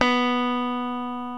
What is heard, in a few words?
LES PAUL